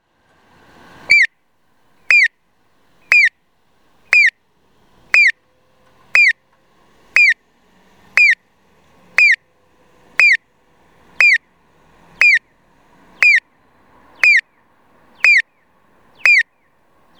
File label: piyo; onkyo kosaten; aichi; moriyama; hyotanyama; nagoya U-TP-1